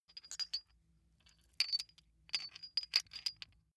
small metal handling jingle; industrie wirtschaft; metall

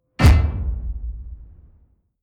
sci-fi projectile penetrate metal bass

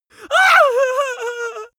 male really afraid whimper; vocals; comedy-cartoon; soundpool

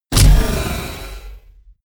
sci-fi click neutral heavy; hits impacts

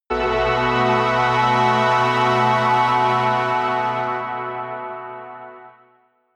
synth hover high, drones

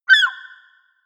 seagull call squawk; natur tiere; voegel

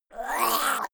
monster gurgling high; kreaturen

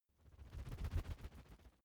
feather flapping quick; kreaturen

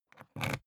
briefcase plastic latch lock key insert ringing

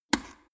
plastic water boiler open lid fast

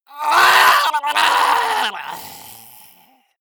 male die gurgling; soundpool

